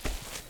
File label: fs grass, singlesteps